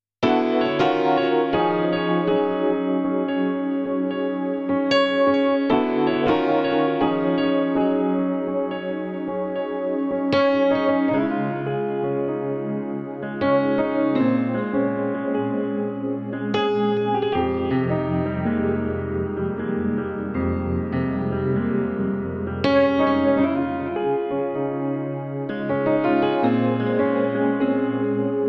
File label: pad